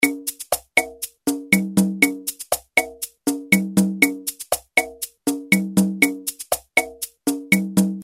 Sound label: RHUMBA, Roland R-8